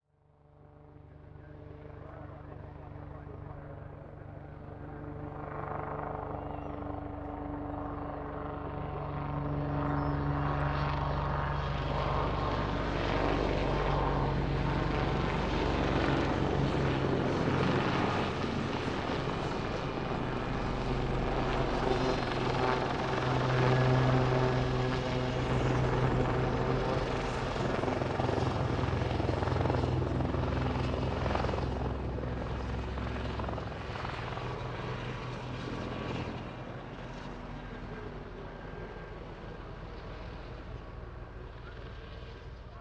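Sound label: Heli Lynx Turn Fly Backwards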